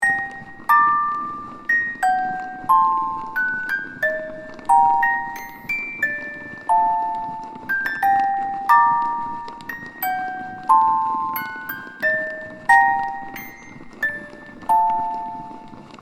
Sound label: Eerie and dramatic valz music box noise loopable; gfxsounds